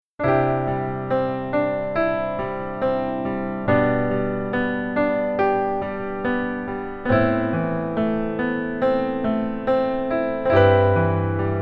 Keyboard Arp

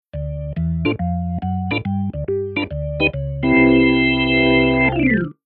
Keyboard Organ Drawbar

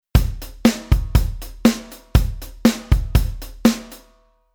Rhythm 8 swing

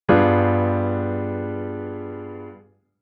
Chord Tension b9

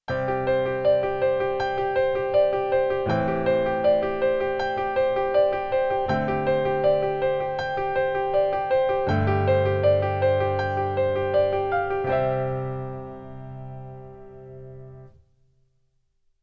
Keyboard Pf Pops Pf

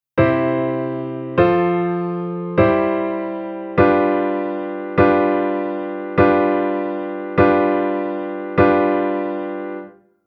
Chord Harmony Rhythm

